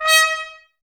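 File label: Brass trumpets D#, Mute; Miroslav Vitous - Woodwind Brass Ensemble; Tps Mute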